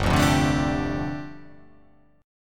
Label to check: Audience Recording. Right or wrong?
Wrong